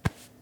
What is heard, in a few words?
Panel Burger Bun Set; Among Us